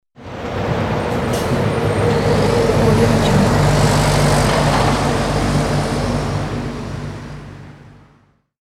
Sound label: truck passing by on the highway